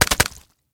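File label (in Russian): приглушенная точная очередь из пулемета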